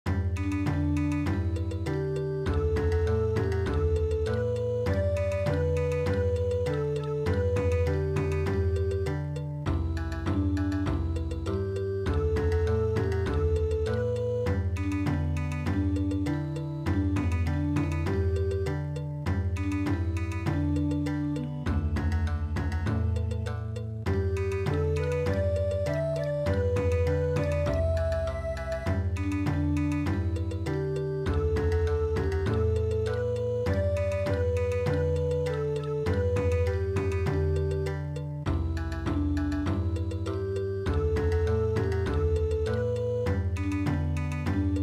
Bon Odori, midi

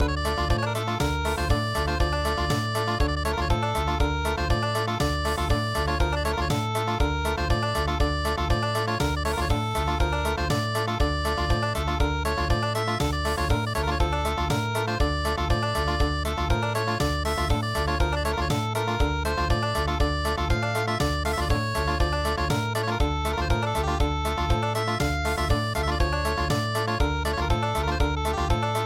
Country; Enzeli

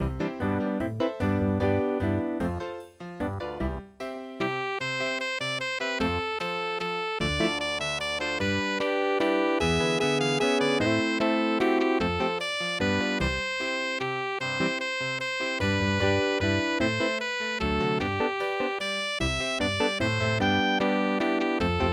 jazz waltz; merry xmas

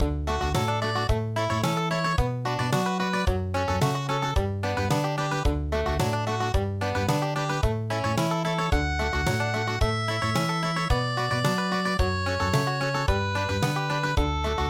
in D Country

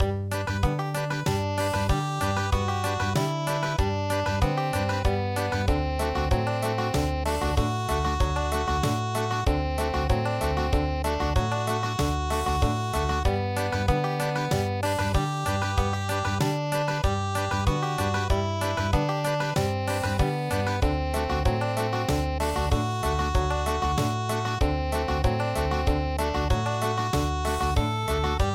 Country; four funny smith

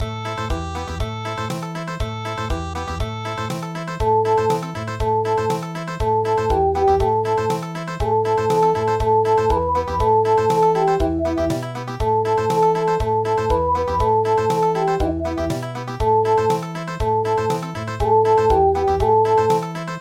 Country

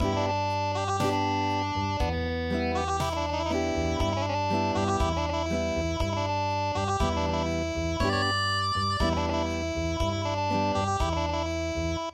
Unplugged; Hataha Hataha

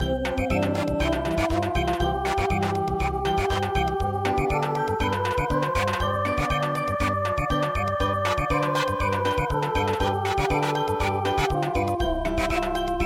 Samba

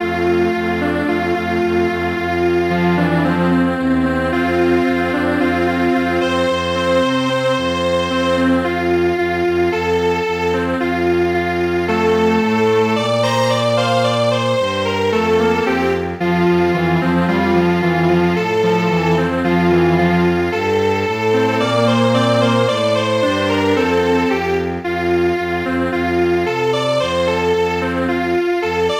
Strings Quartet

